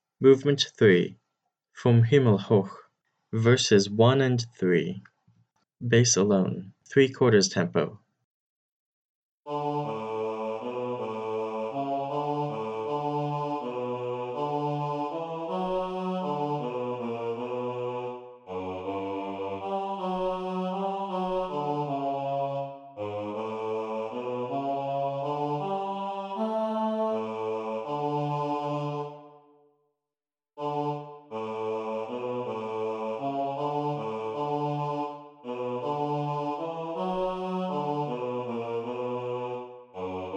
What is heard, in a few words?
bass alone three quarters tempo, choir